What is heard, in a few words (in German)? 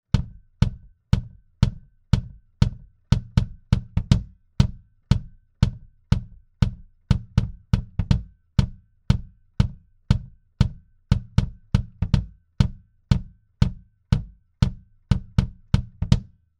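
SSL 611 E EQ BD ohne EQ, bass drum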